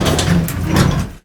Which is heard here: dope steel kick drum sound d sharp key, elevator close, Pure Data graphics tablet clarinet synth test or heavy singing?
elevator close